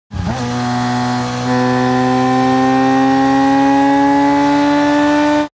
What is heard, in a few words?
MOTORCYCLE Sport Bike Short Acceleration; TRANSPORTATION